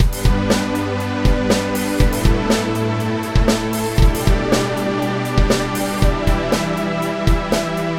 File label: Monologue; SSL Fusion stereo image